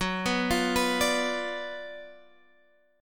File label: Gb Major 7th Suspended 4th Sharp 5th, down, Guitar, Standard; arpeggiated; JGuitar